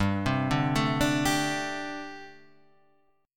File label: G Suspended 2nd Suspended 4th; Gsus2sus4 Chord {3 3 0 2 3 3} arpeggiated